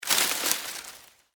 Foliage Movement, Texture, Foliage, Movement, Palm, Frond; Rustling; Sound Effects:Objects; Blast Wave